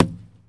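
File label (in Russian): скоростной короткий звук полёта бейсбольного мяча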